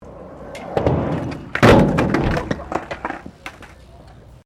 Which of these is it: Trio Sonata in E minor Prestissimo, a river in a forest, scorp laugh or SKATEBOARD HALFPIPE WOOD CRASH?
SKATEBOARD HALFPIPE WOOD CRASH